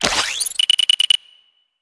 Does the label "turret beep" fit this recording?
Yes